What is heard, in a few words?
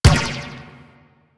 Ball Bounce Rock; Warframe; Missions; Tenno Ball